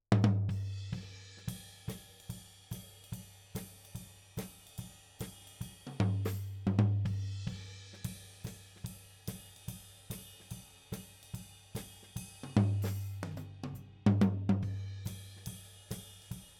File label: Tom